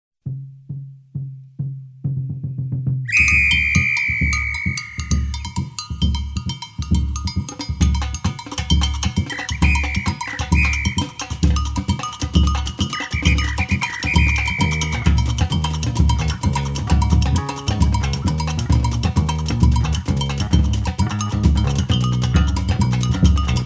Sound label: Samba